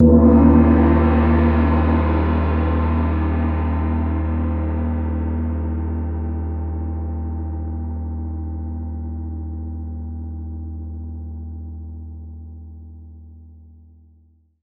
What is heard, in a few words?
prc wuhan, MIXED GONGS; WORLD INSTR 2; WORLD PERCUSSION